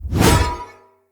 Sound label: Great Sword Whoosh Air; Weapons; Swords; Dendrit Ara Great Sword